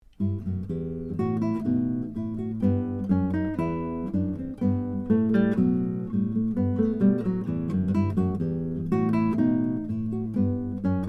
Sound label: Bouree